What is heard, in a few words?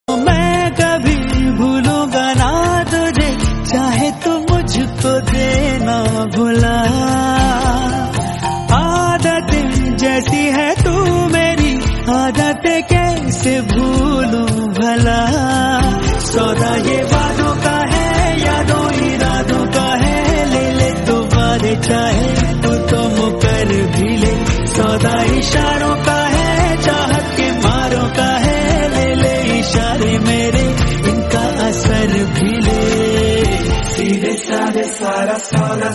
hindi song